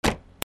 CARS

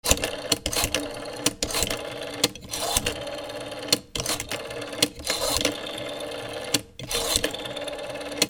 PHONES

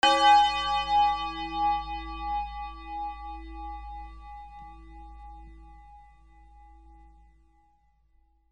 BELLS

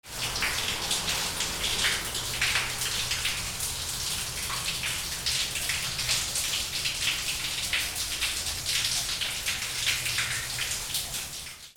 WATER

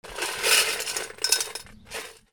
GARAGE

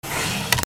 FRICTION

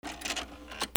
HOUSE

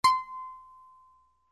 MUSICAL

